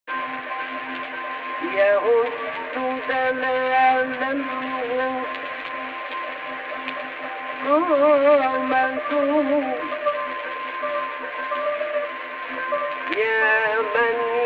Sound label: AHH, Egypt Rast